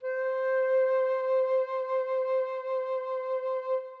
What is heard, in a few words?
Flute C4; Synthetic's Serum Collection